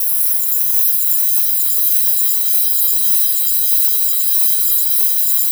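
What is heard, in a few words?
ELECTRIC SHOCK; Spectrasonic Distorted Reality 1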